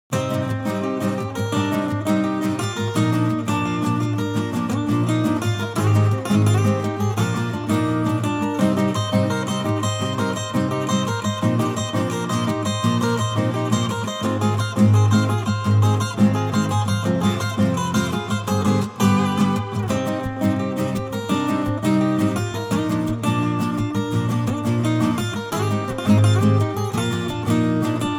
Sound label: Acoustic Short